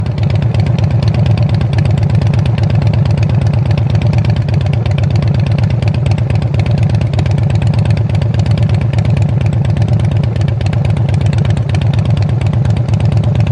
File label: V&H Shortshots Staggered, motos exhaust; Sportsters